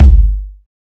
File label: ambient bass drum g key